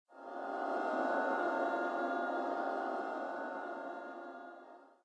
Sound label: corridor, undeadtower